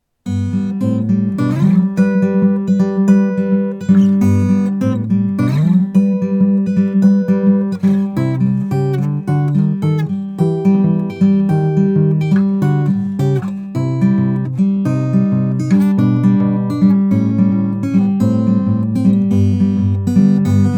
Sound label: Guitar Cover